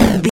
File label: retro impact hit general